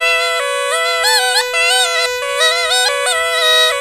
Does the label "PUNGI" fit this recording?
Yes